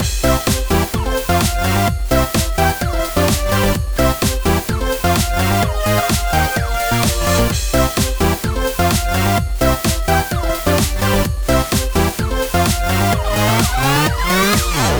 Bgm skill; dj cookie

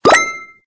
Orange Ball Get

